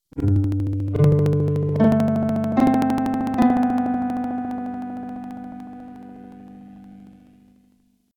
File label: startup sound